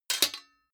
sword sabre guard parry soft

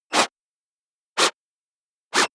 scratch

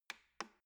embedded button press

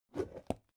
plastic bowl open long

